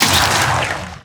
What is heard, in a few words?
dragon bite